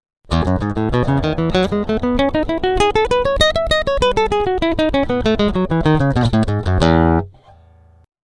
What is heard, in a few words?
e maj 2; SoloEtte